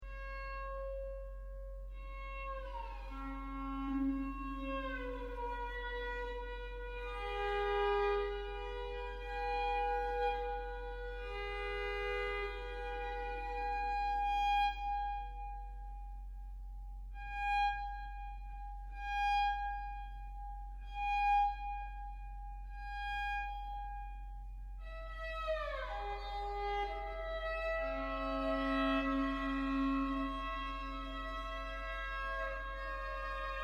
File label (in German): viola sonate 2-satz; kammermusik